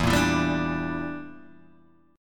E Minor 7th Flat 5th E, Guitar Open G

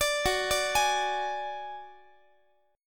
F sharp Suspended 2nd Sharp 5th, Guitar; arpeggiated; x,x,17,16,18,19; down; 4A,4E,5A,5D,5Gsharp,6Csharp

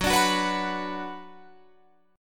Gb Suspended 4th Sharp 5th, Guitar, Drop D

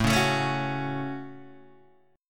A 6th, Guitar, DADGAD; 7,4,7,6,4,4

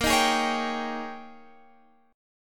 Ab Major 7th Suspended 2nd Suspended 4th

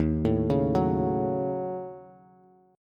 Eb Minor 7th Flat 5th, Bass; arpeggiated

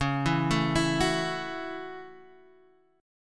F sharp Minor 7th C sharp

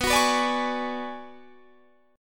B Minor 6th Add 9th B down Guitar Standard; Bm6add9 strummed; Bm6add9 Chord; JGuitar

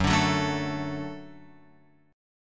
F sharp Minor Sharp 5th F sharp; F#m#5 Chord {2 5 4 2 X 5} strummed

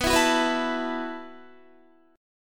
B 7th Suspended 4th B down Guitar

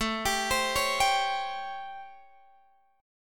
A 7th Sharp 9th A down Guitar Open G

